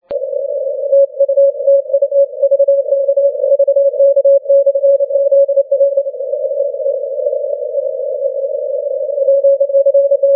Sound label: 20m cw